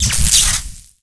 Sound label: blink fire, weapons